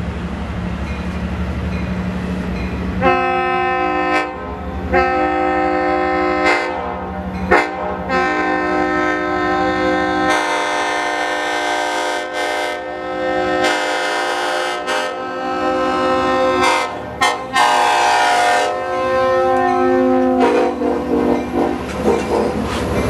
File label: K5LLA, horn; 521 destroys Glen Street November 2018; lirrhorns